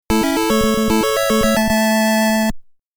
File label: LEVEL UP! (Level Up BGM); Esper Dream 1 2 Sound Memorial